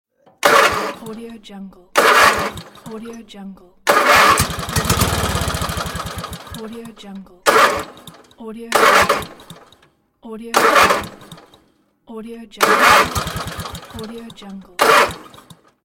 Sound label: broken engine